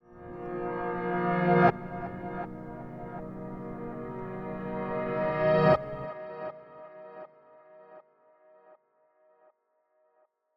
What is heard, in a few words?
Reverse Production Element Piano; MatiasMacSD; REVERSE PRODUCTION ELEMENTS